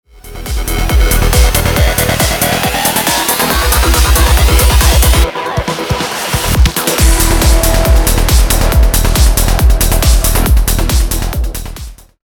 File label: transition